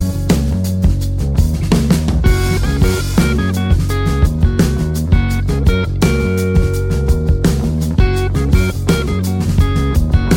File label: Instrumental